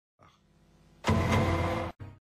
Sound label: dongdong